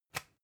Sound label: usb port metallic out hard